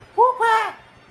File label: popa sound